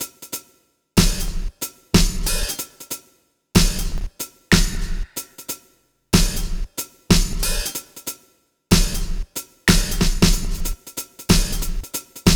Snares Hats Loop, 93bpm; Zero-G - Soundclash Nu Dance Electro; Acidized Wavs; Construction Kits; Smooth Circuitry

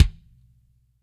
DRY NS, NATURAL KIT; Spectrasonics; Burning Grooves CD2

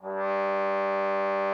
trombone G2; Xsample VOL-3

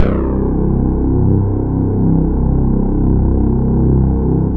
THICK SYN

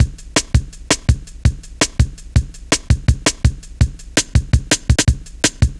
Tin, Jungle, Drum Loops 165.5; Zero-G Jungle Warfare 1, 2 & 3

